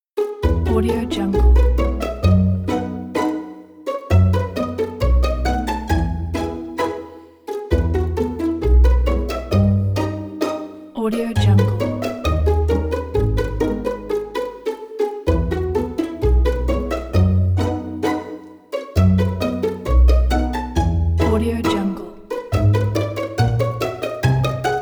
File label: pizzicato; evdokim